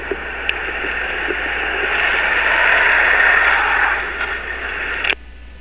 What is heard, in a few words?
powerslide